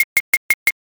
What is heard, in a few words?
knife